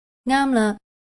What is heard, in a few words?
correct; aphasia